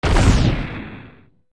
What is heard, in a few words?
Arrow Hit Fire; Magic arrows (Fire, Ice, Light) hitting something